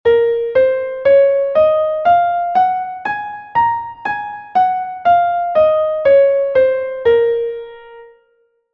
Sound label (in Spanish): si bemol menor natural